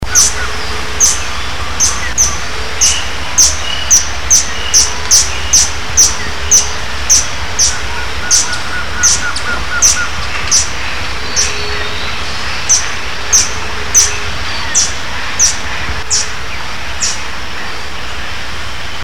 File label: blue tailed hummingbird